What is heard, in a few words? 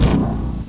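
gargantua step; svencoop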